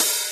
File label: Real Open, Hats